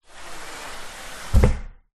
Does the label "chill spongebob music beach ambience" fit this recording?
No